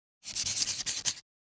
Shoji paper handwriting pen scribble

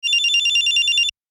Old cellphone ringing single

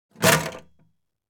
Small garbage bag objects throwing away dumpster; household

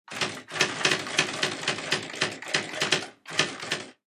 IBM Selectric electric typewriter typing

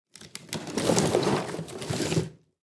Wood pile movement

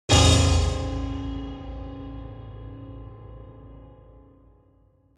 Crash on piano strings

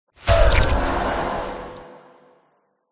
Acid ball cast hit

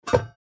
Cooking pot lid open; utensil

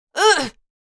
Adult female vocalization effort

